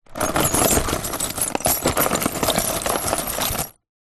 industrial rummage through large box of screws and nails